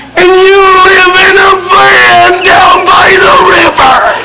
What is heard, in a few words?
river